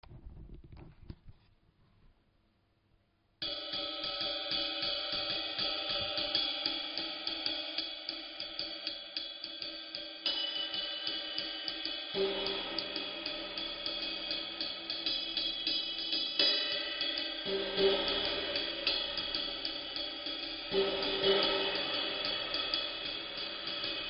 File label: Masterwork Natural